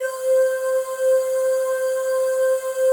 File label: DIGI PAD